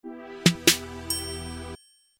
SMS

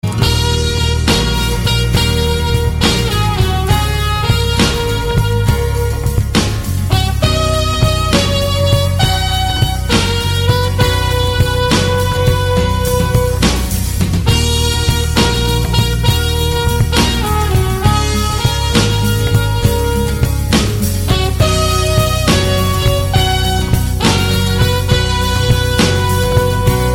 trumpet

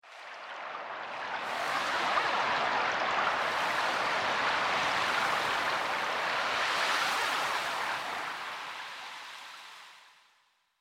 seashore

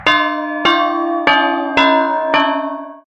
Bell; Wraith

